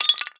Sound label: Physics Pottery Impact